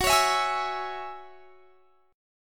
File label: Gb 7th Suspended 2nd Gb; x,21,23,21,21,24; Listen to Gb7sus2 strummed